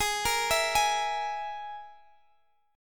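Ab Suspended 2nd Sharp 5th chord, Guitar, Standard tuning; x,x,18,15,17,16; arpeggiated; JGuitar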